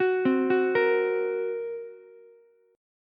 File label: A sharp Suspended 2nd Sharp 5th A sharp, Ukulele; arpeggiated; A#sus2#5; JGuitar